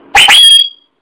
Sharp Sms

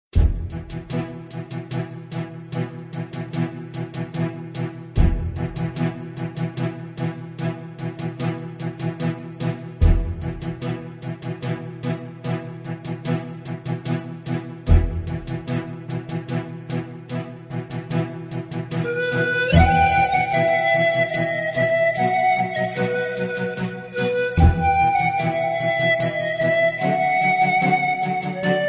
Okarina

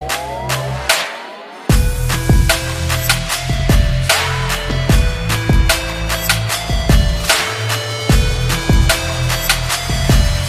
Best Trap Ever

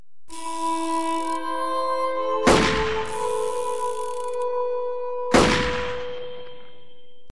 Gunshot; RockStar

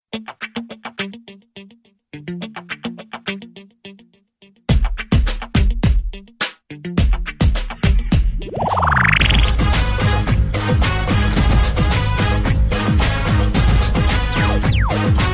Indian Fusion

